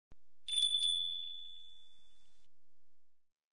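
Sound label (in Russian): колокольчик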